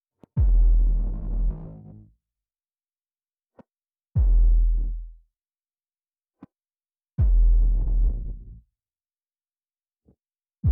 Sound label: Impact Thud Bumps Periodic